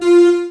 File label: axle, Synths; Notes